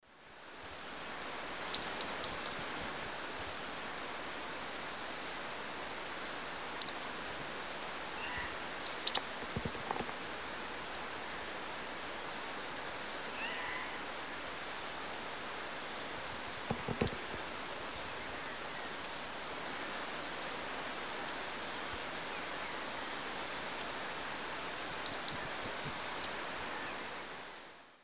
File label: Epinecrophylla ornata, song and calls male; S. America; Eastern Lowlands